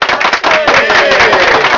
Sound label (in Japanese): kansei, cheer; Nintendo; July 2020 Gigaleak